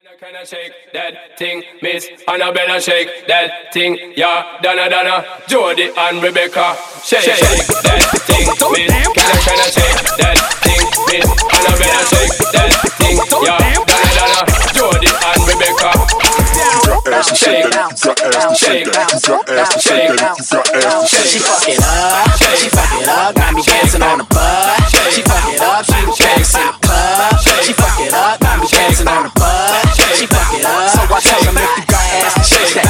Acap In Scatch Down Tempo 102 95 BPM

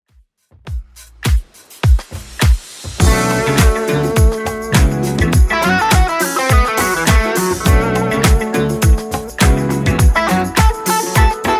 80s Redrum 103bpm

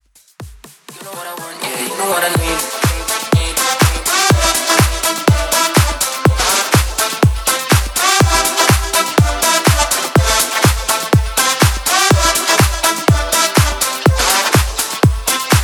Chills Flip House Outro Fade 123 BPM

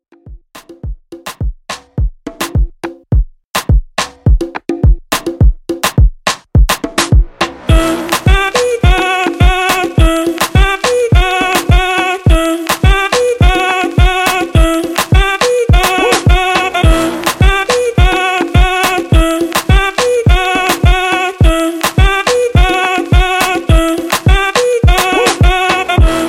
Latin Afrobeat 105Bpm

